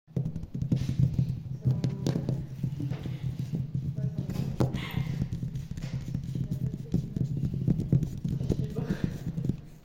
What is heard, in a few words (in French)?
tapotitable